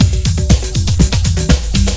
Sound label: BEAT, Beats 113-123bpm, Hip Hop; E-MU Formula 4000 Series Vol. 1; Hip Hop Nation